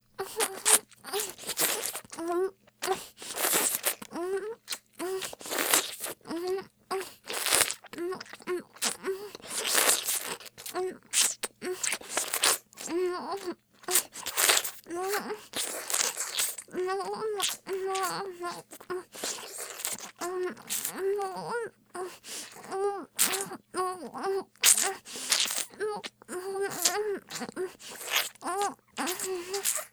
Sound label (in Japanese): フェラ; 幼馴染上位